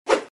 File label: Sfx Knight Attack; Mighty Knight 2